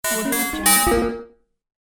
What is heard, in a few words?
electronic game collect ding